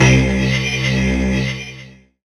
weird c; Chillout (ambient1&2)

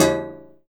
XTRA PERC

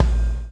BLOW; Spectrasonics - Backbeat

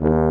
D#2 TUBA

